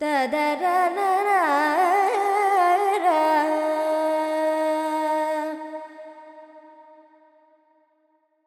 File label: FX Lydian, Vocals, Carnatic Female, Alaps; Sample Banks